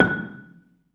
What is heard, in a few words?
BELA F#5, BELAFON, Asian Traditions; USB Soundscan vol.60; AKAI